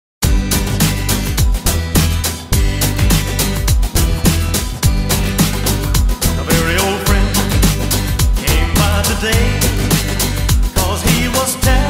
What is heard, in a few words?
remix shuffle dance mix